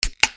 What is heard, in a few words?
KITCHEN